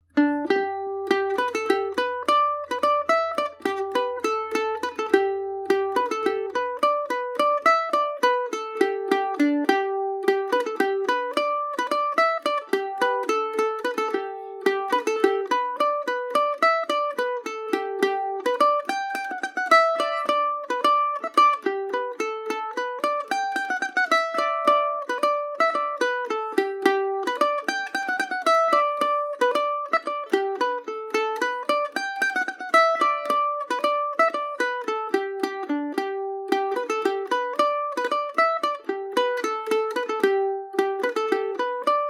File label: finbar dwyers polka gmaj, mandolin